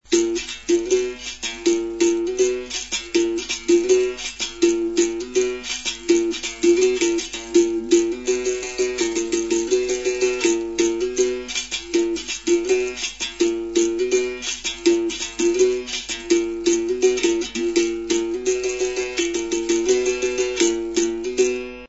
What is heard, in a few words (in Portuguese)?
Toque de Amazonas